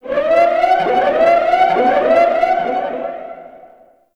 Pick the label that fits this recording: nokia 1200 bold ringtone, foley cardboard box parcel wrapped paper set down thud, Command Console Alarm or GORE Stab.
Command Console Alarm